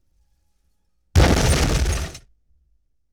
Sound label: Explosion, 5.1 Surround